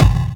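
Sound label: percussion fx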